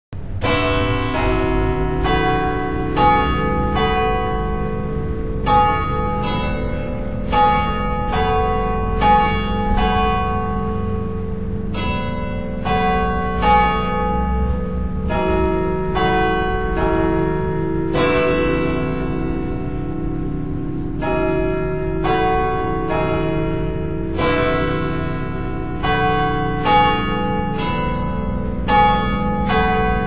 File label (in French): salve; jumet